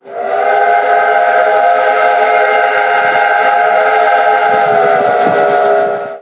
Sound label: horn